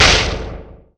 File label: Faceless Void attack